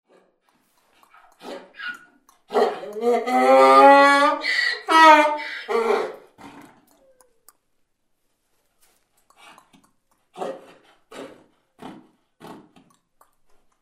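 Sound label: sounds of donkeys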